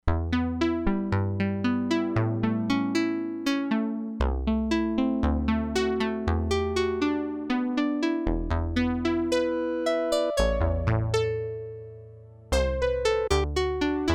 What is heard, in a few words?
SHIFTED GUITAR, Crumar Bit 99; Factory Programs